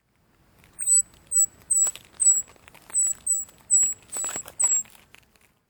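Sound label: Eurasian Treecreeper, Chichibu; kibasiri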